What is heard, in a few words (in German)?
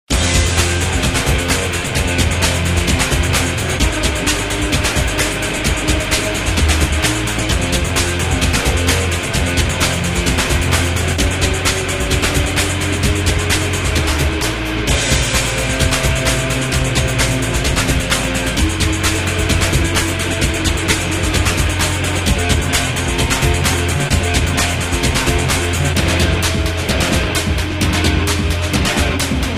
musik